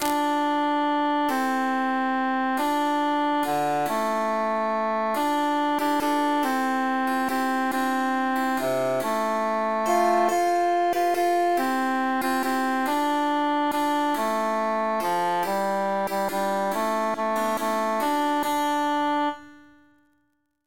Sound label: bassus emphasized; we be souldiers three